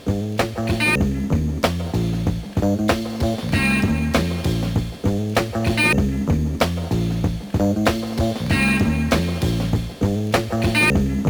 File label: suspicious beat